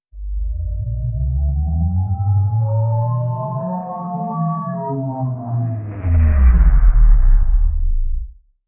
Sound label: robot pc power on sci-fi